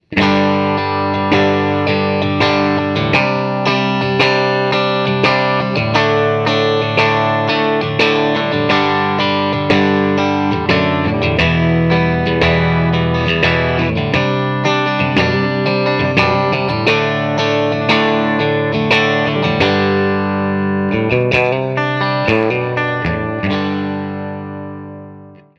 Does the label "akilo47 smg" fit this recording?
No